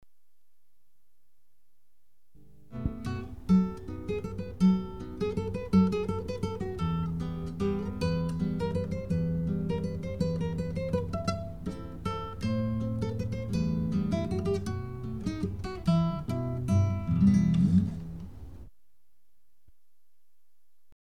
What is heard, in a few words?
A Waltz